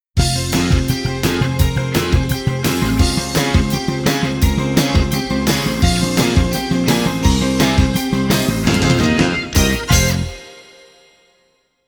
Fanfare; Network Production Music; NM101 Ain't No Stoppin' Us (Multimedia)

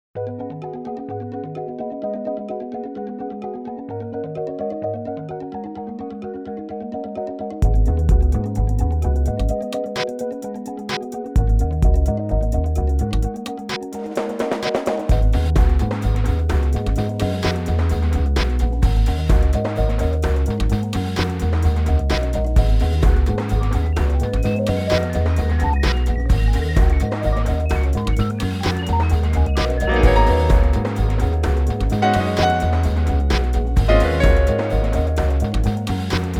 Modem Jazz; Network Production Music; NM216 Hi-Tech Visions

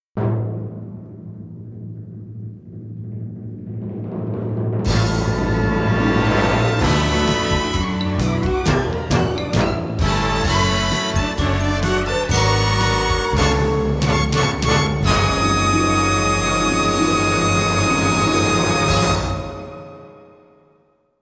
Fanfare; Network Production Music; NM110 You're The Star! (Multimedia)

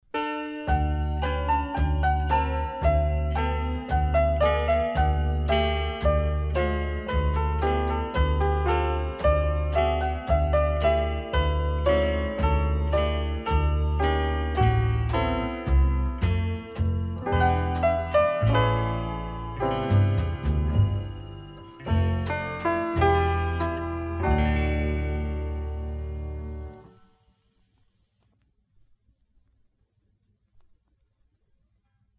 Petite Prelude (Piano); KPM 1000 series

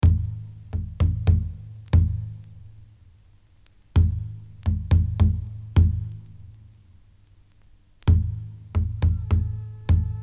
African Suspense; KPM 1000 series

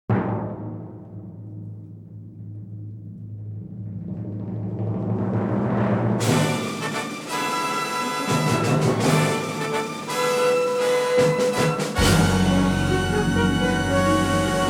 Fanfare; Network Production Music; NM102 Reaching For The Top (Multimedia)

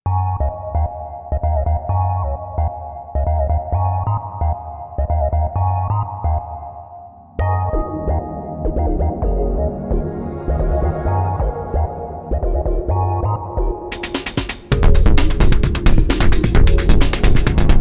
Bleep Machine Loop; Network Production Music; NM288 Tradeshows

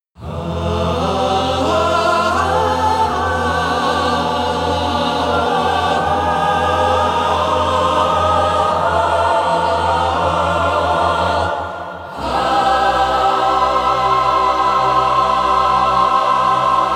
The End Fanfare; Network Production Music

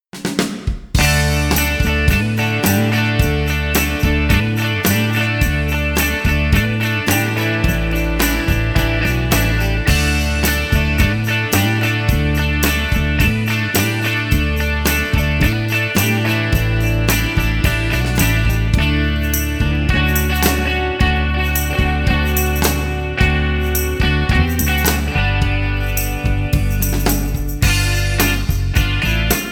Tambourines & Twelve Strings; Network Production Music; Fifties and Sixties Generation (Specialty)

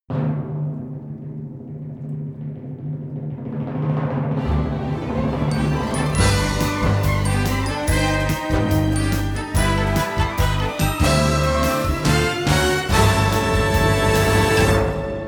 Fanfare; Network Production Music; NM110 You're The Star! (Multimedia)

